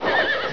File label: horse